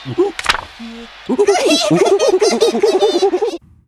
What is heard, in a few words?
bubbles